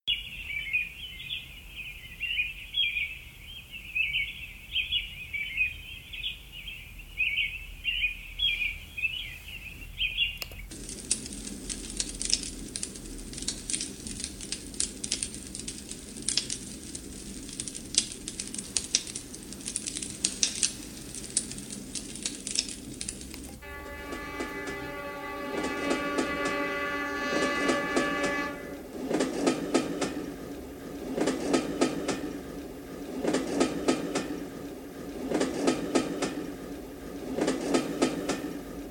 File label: birds fire train